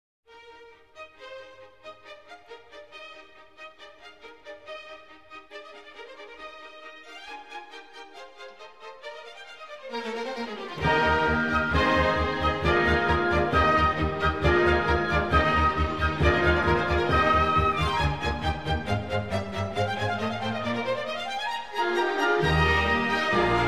Trumpet Concerto in E-Flat Major, Finale Allegro; Hob.VIIe1

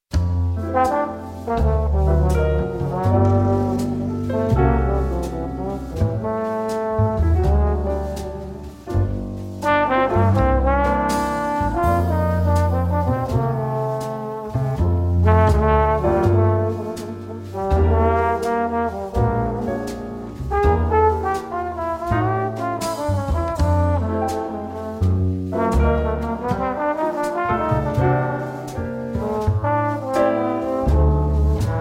Jazz

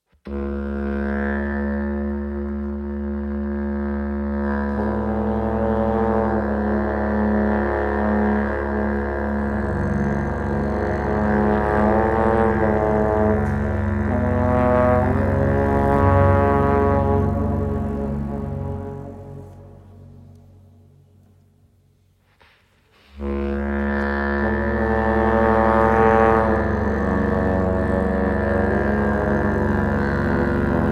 F Sharp Minor

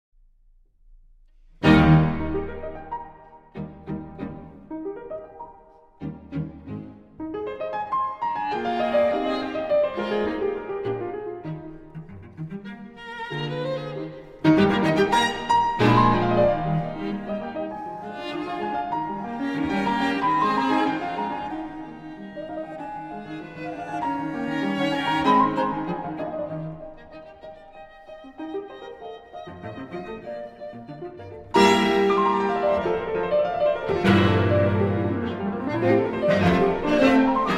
Piano Trio in E-Flat Major, Allegro

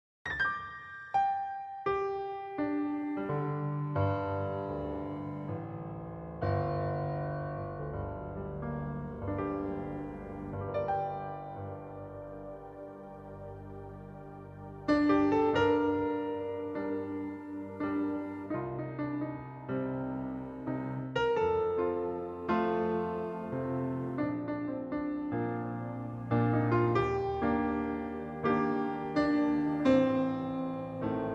Piano Bar

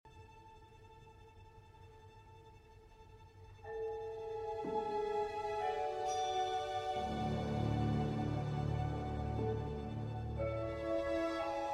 violin, cello and orchestra; Pas de Deux, Double Concerto; (2014) Part II

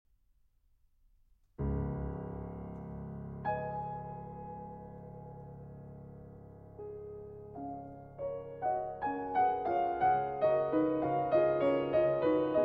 Barcarolle in F-Sharp Major; Chopin; Op. 60

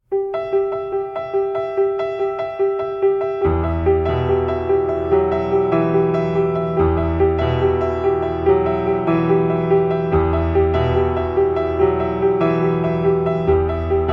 Piano Solo

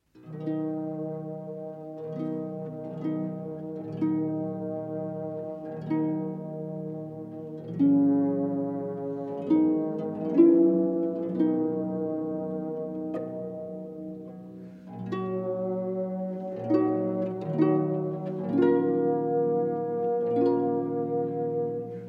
Harp Concerto in E Minor II. Adagio

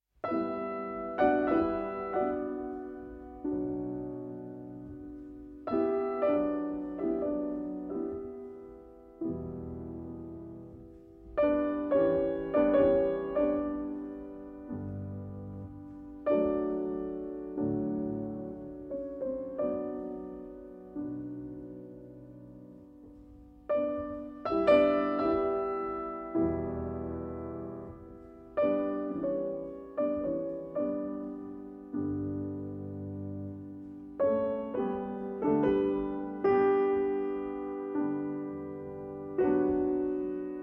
Preludes in B-Flat Minor

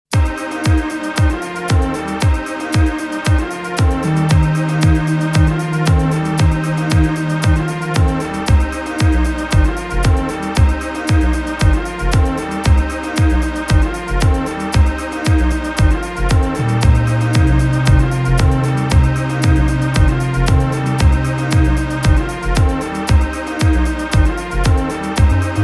Strings

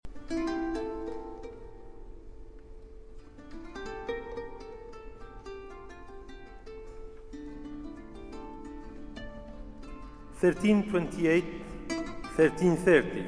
Taqsim and Narration

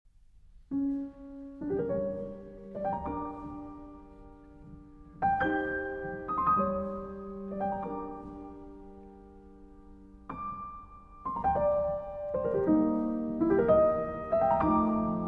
Grand Piano

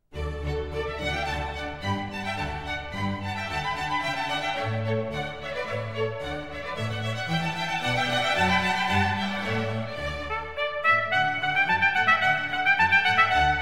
Trumpet Concerto in D Major, Allegro; Telemann

